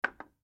Deodorant Cap Set Down; Primping; Household; Human; Blastwave

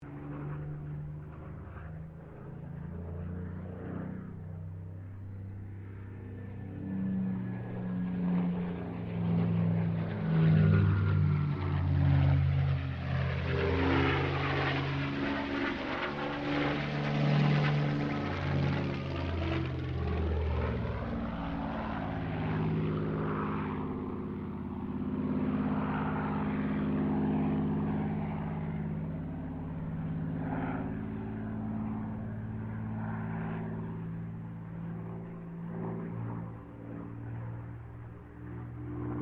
AIRCRAFT SUPERMARINE SPITFIRE MK-XVI PASS

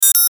Digital Bleeps; Beeps; Multimedia; Blastwave

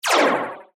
Laser Shot Synth, Delay; Science, Fiction

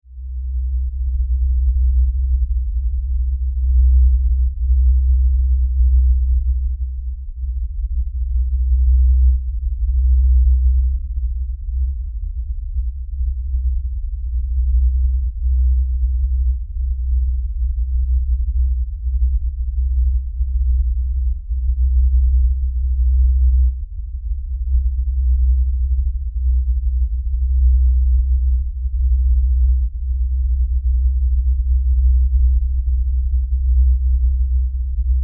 Lfe Rumble; Production Element; Deep Tones (Sound Effects:Sound Design)

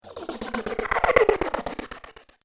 Stellar Space Chopper, spacecraft sound effect

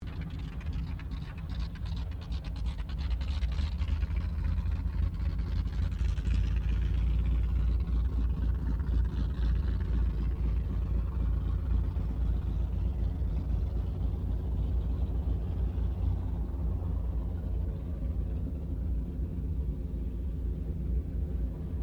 AIRCRAFT AT 6D HARVARD MK III TAXI SHUTDOWN; Temora Harvard MK III; Airplanes

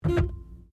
CAR TOYOTA COROLLA HORN SHORT INTERIOR, hatchback; 2009 Toyota Corolla hatchback; Cars (Sound Effects:Vehicles)

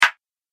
Analog Click

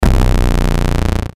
Hit Deep Distorted Bass; Deep Tone; Sound Design; Blastwave

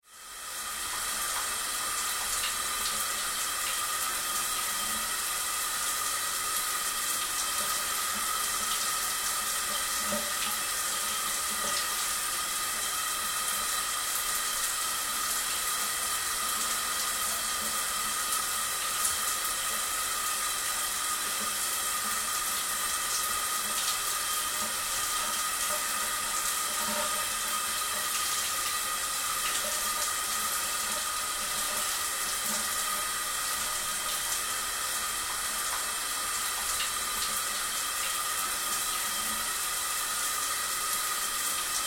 Water Shower Run Long; Bathroom; Household

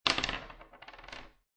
Dice Throw One Die On Wood Table; Dice Sound Effects; Dice (Sound Effects:Casinos)

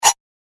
Fantasy Sharpen The Blade, sword sound effect; role playing games, magic videogames; Andrew Fleming

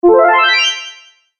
Fantasy Magic Accent; Magic Sound Effects

